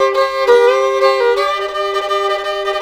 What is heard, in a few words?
violin slayer, fiddle; music; szf; zombat